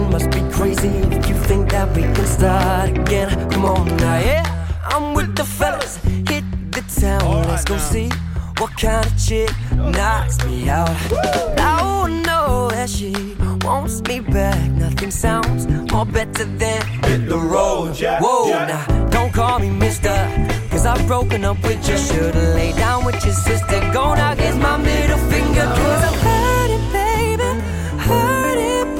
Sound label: Jazz